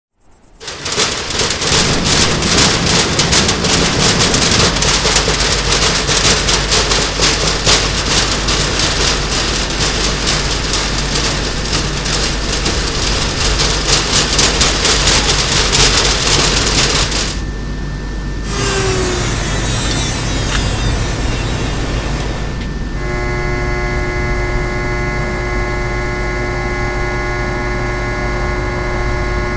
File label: Factory and sea; Εργοστάσιο κοντά στη θάλασσα